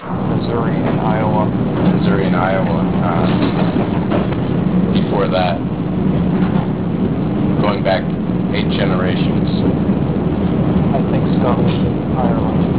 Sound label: train